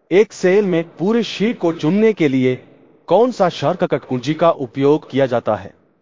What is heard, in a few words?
techno